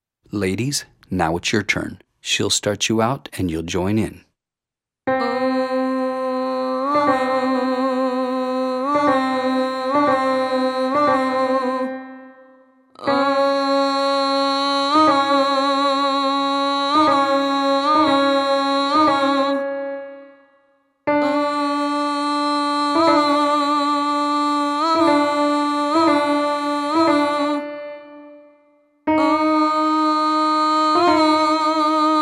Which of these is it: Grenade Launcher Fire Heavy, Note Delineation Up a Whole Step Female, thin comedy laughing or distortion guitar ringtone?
Note Delineation Up a Whole Step Female